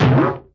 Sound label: vintage cartoon boink; Cartoon Sound Effects Kit